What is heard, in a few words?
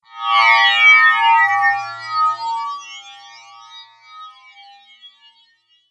sitar